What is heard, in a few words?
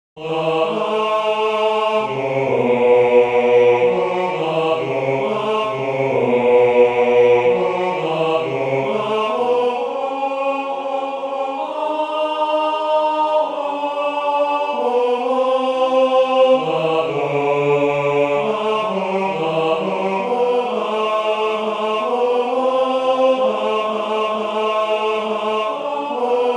choeur tenor; WSS